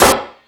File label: Sluggo Drums, Drum Machines